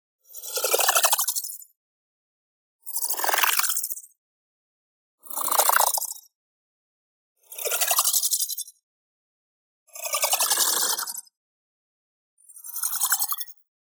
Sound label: ABILITY RELEASE Tremolated Ice; boom library; Matter